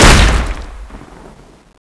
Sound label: mgsm-1 exp, weapons